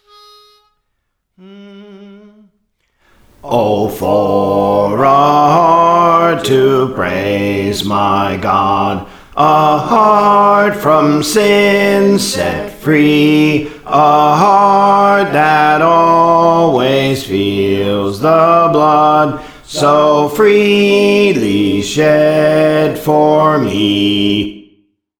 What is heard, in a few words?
Bass, Songs; Praise For The Lord Training; O for a Heart to Praise My God